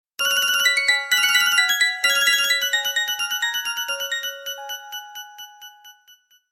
fly classic tone klassicheskaya melodiya telefonov marki fly

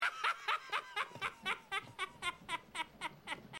smeh kukly chaki uzhasnyy smeh

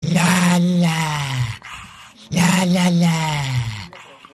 sobka izdaet kripovyy zvuk lya lya; sms la la dog

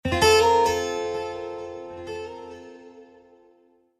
schastlivaya sms klassnyy rington sms

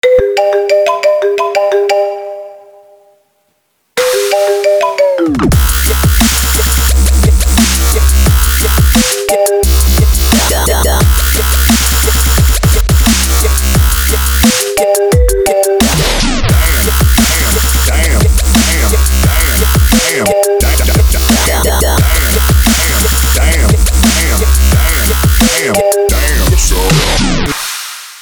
iphone marimba basstrick standartnaya melodiya iphone v dabs

